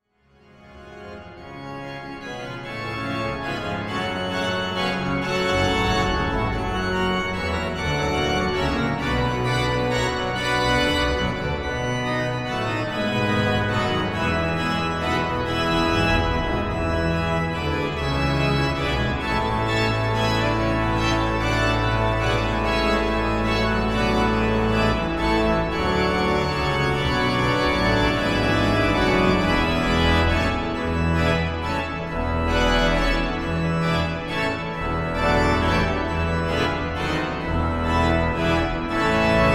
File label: Toccata F BWV 540